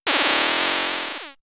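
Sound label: Laser Beam, electronic fx; X-Static Goldmine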